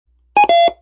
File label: CooCoo; noisetoys; BEEP